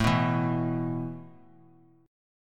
A Suspended 2nd, Asus2 chord; strummed

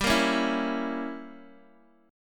G Diminished 7th, Guitar, DADGAD; x,x,5,3,4,2; down

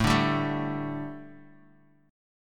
A 7th Suspended 4th, A, down; A7sus4 strummed

